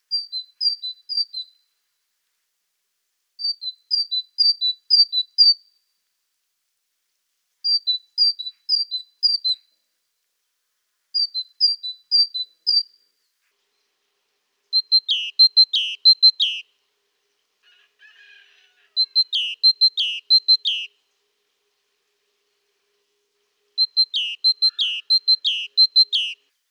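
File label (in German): Der Kohlmeise Gesang, Vögel in Europa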